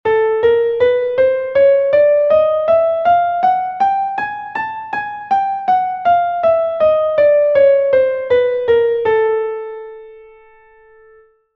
the chromatic scale; Essentials of Music Theory: Elementary

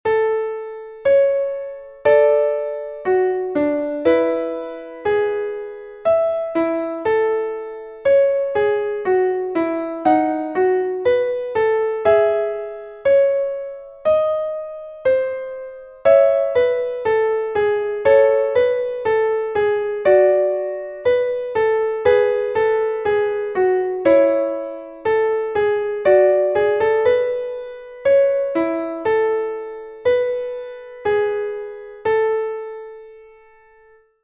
mixed intervals rhythm; Essentials of Music Theory: Elementary

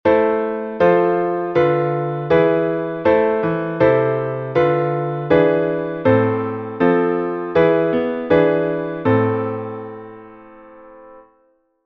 dominant seventh chord b; Essentials of Music Theory: Elementary

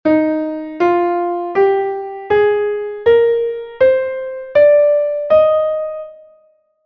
scale of e flat; Essentials of Music Theory: Elementary

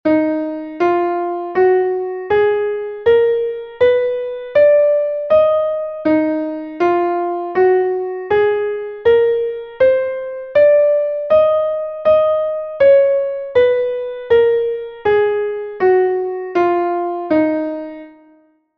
scale of e flat harmonic; Essentials of Music Theory: Elementary

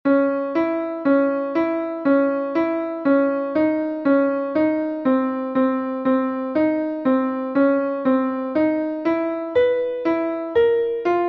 intervals; Essentials of Music Theory: Elementary

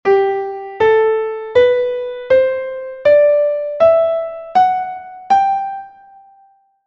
scale of g; Essentials of Music Theory: Elementary

